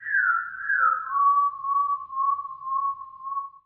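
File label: Special Photo Barcode Forest Environment Bird; viewfinder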